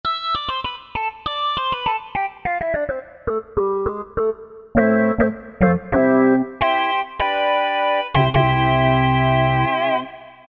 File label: Pan Wave, Korg M1; Factory preset examples